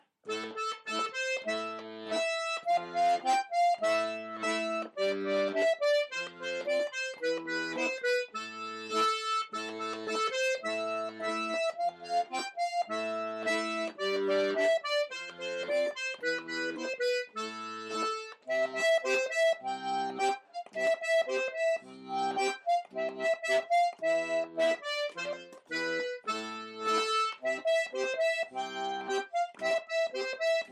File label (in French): jenkka, 1ere voix